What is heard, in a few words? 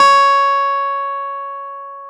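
clavinet; Roland LCDP10 Keys of the 60s and 70s 2; Clav CA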